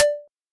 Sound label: Scroll; OpenTaiko